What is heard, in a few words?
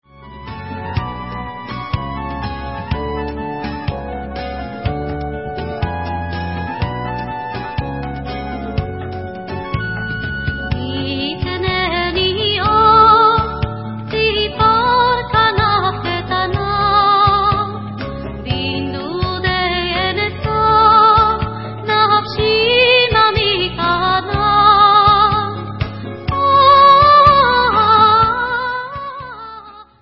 Vocal